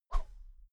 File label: whoosh thin slow